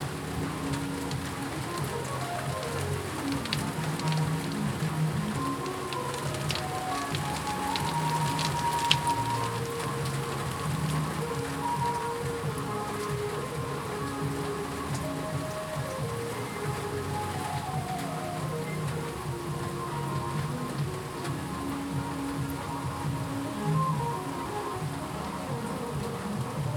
雨

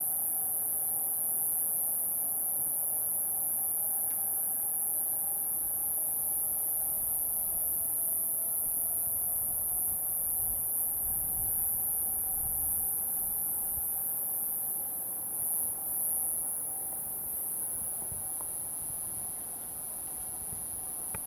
虫の声

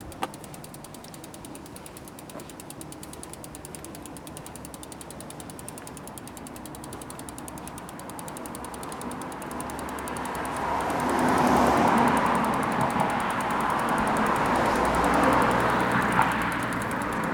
自転車と車の音

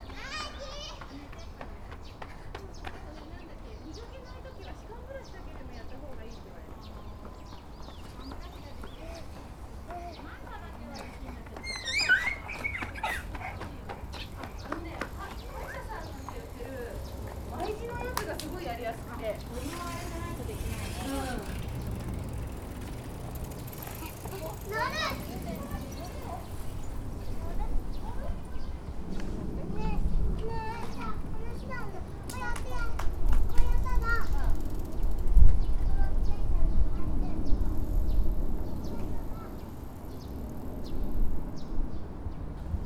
子供の声

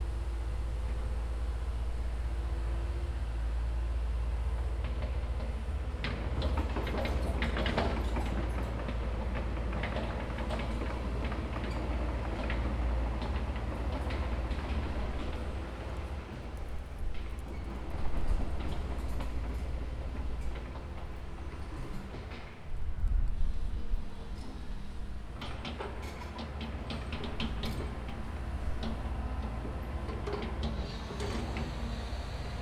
クレーンによる土起こし

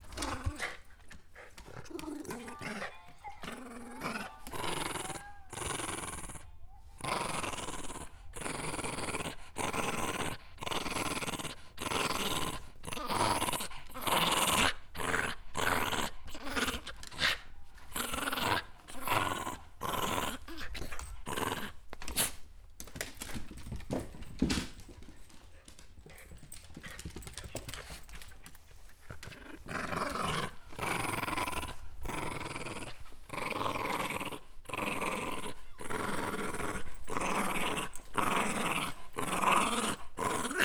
柴犬とひも遊び

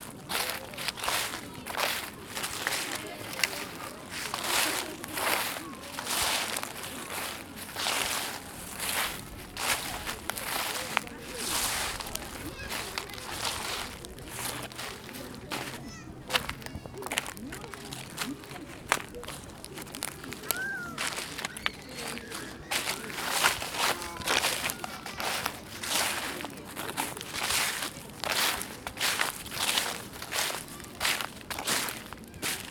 新宿御苑 落ち葉

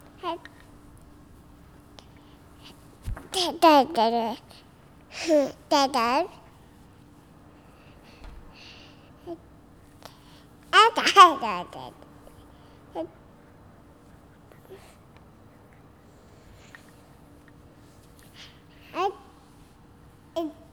赤ちゃんの声

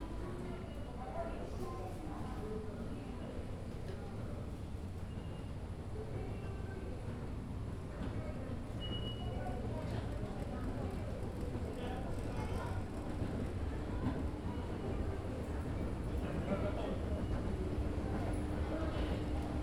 駅構内

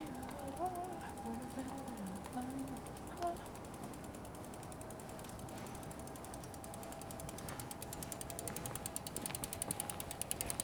自転車の音と声

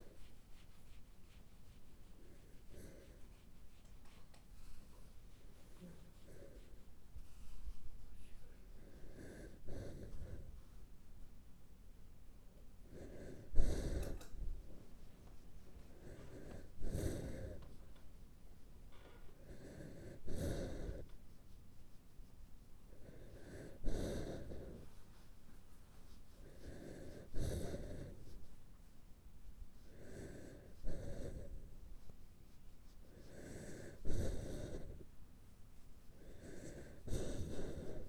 柴犬の吐息

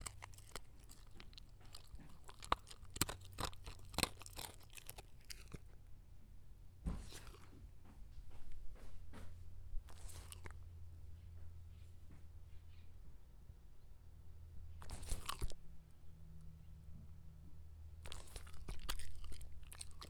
柴犬の食事中

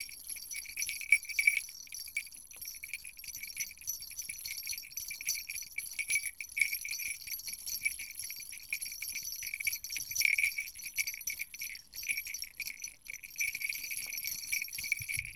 玄関の鈴

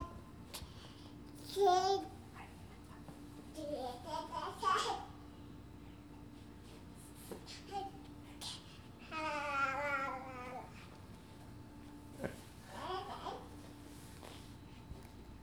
赤ちゃんの声

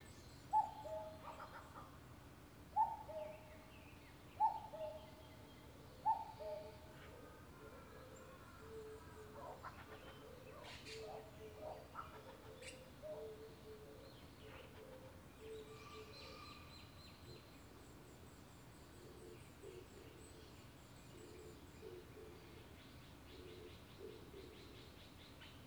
朝

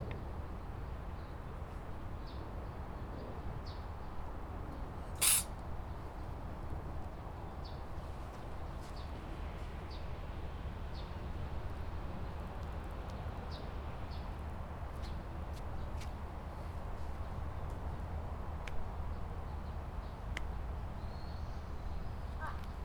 猫のくしゃみ

